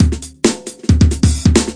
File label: 135 bpm, triple roller front hats, drum loops and breaks